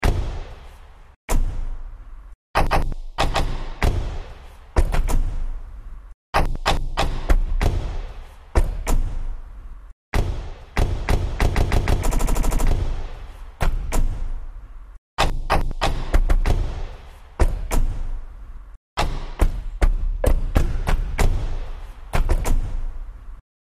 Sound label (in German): Autotueren